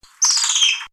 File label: calmer monkey call